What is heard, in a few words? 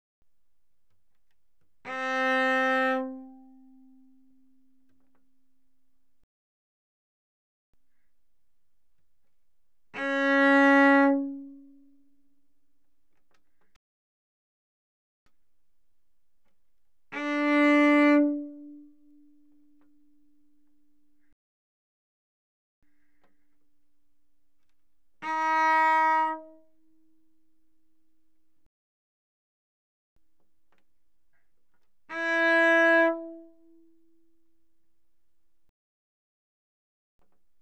Cello arco mf sul D C4Bb4; Strings